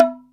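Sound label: medium round; REGGAE+ETHNC; Pro-Rec - Synthsonic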